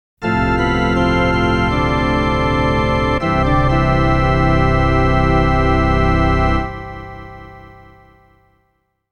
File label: Melody of Celebration; Super Mario RPG